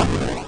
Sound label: EXPLODE; Diamond Hollow 2